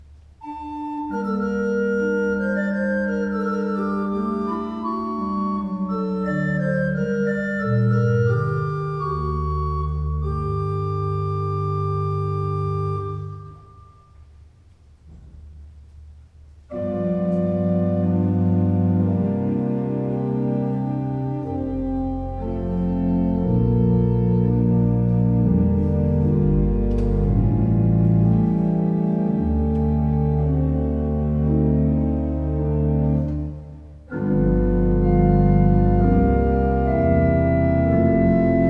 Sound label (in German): Beckerath, gebrauchtorgel